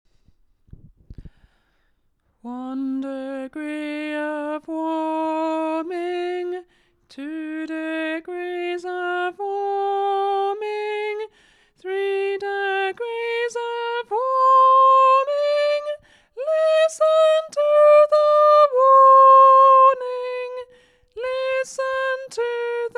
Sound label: sop tenor